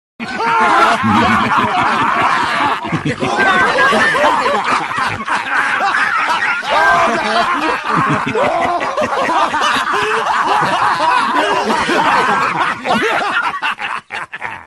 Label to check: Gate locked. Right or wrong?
Wrong